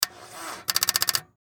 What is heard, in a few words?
Car Dead Battery; Car , Attempt To Start , Battery Dead With Clicks; Cars; Vehicles